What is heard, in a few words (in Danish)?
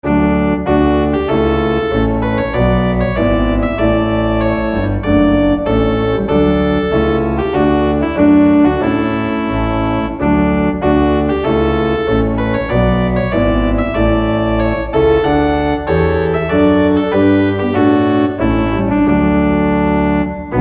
Postludium D